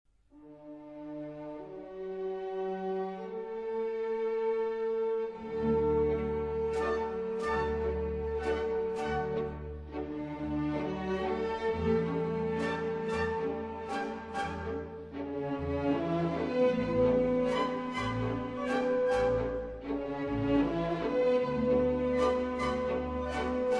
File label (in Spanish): vals; castellana; infants